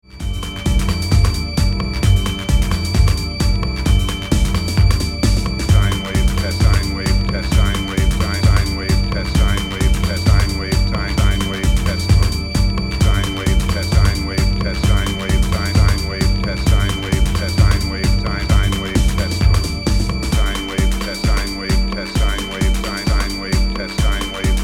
Sinewave Test Tone

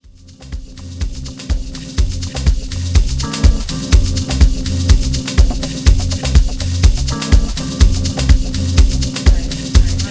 G Jazz

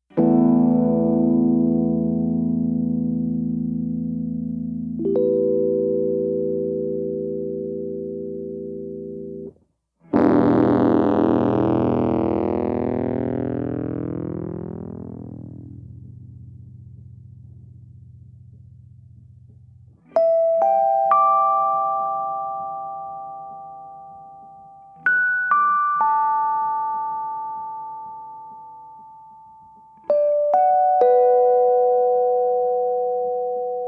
Triangles Rhodes (Stem)

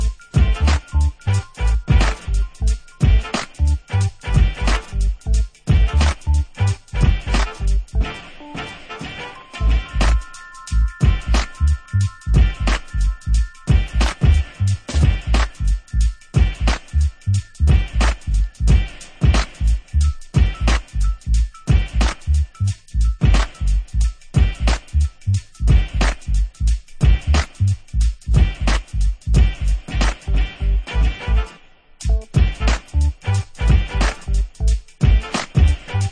(instrumental)

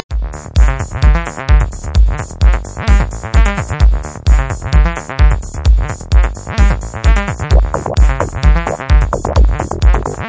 techno music

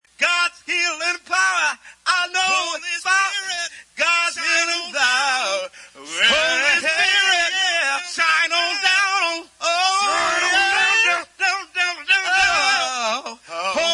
acapella

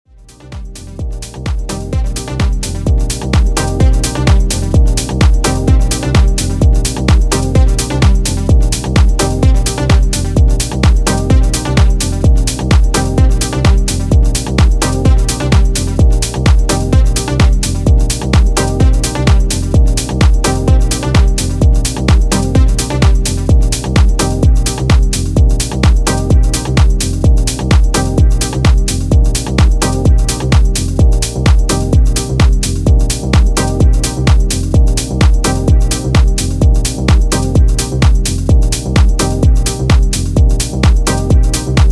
Rhythm Instrumental; Mixmode Recordings

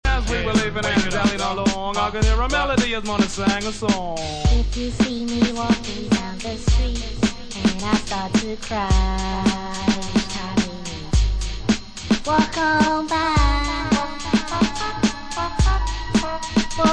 classic hiphop medley

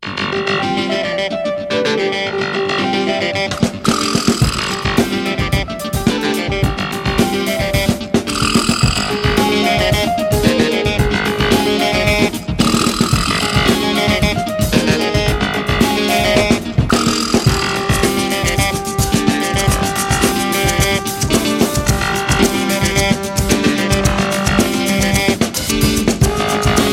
Woosh

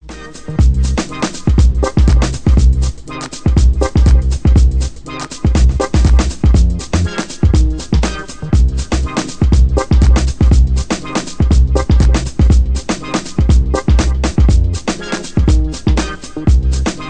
Instrumental

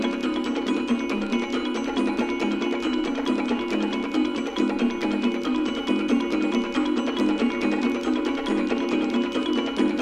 Drumming (part IV); Deutsche Grammophon

